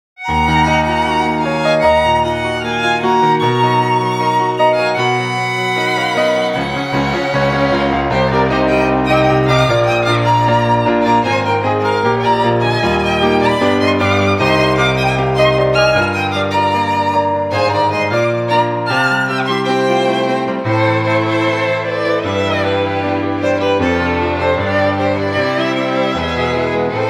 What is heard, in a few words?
Piano+Violin ver.; Fate Violin Zero